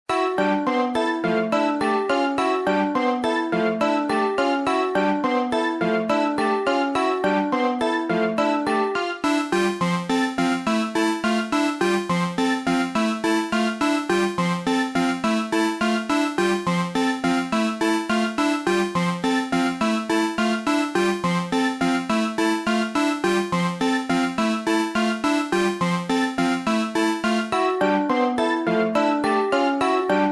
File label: Chime Train